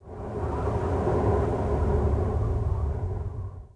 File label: WIND, wind; zones